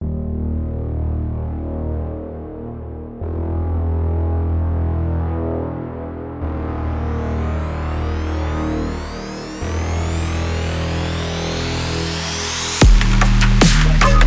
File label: bass drop